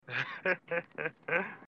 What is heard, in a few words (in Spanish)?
risa; eddie murphy